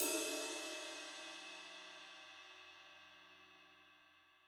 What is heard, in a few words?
Ride Stick Edge 90s Heavy Rock; Drums